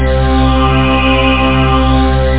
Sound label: Spook synth; Instruments